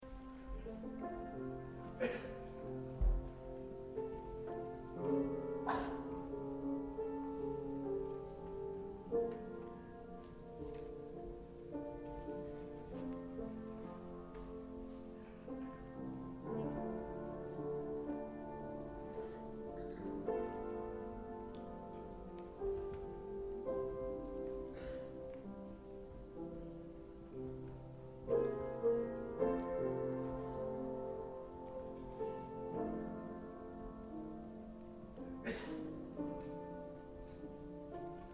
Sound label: amazing grace (piano)